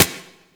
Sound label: Lasrian APC Turret Low; weapons; Warframe